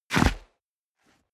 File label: step boots concrete grit jump; Footsteps; schritte